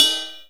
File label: Cone; music; rashdemo